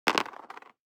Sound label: DICE on Cardboard, Throw and Roll, Standard, Five Dice; Samples; ArticulatedSounds; DICE Sfx Party (Extended)